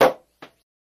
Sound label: Champagne Cork Poppi; eating & drinking